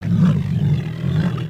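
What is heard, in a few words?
Sabertooth speak; creatures; pets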